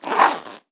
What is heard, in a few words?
Zipper Zip